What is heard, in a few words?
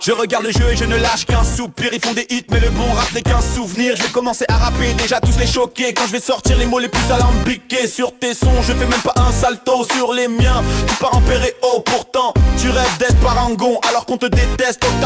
Sad Style